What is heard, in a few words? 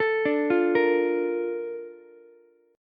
A sharp Major 7th, Ukulele; A# M7 arpeggiated